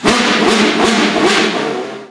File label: acc1-3